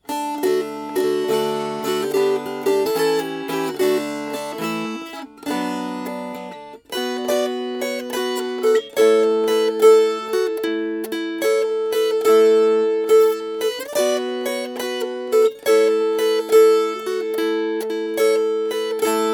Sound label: Strummed